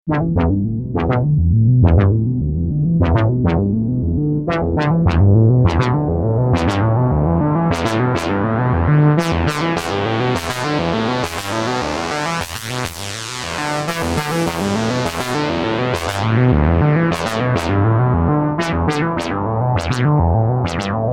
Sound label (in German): Noise Sequenz